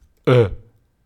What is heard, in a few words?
Voice Ugh